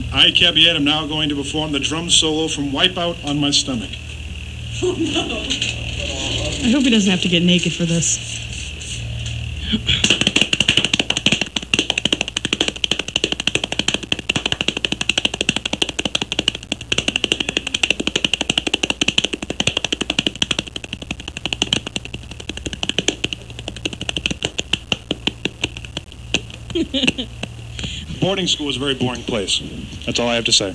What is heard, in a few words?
wipeout